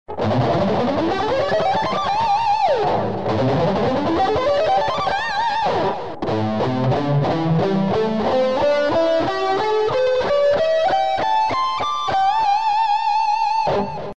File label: pentatonic full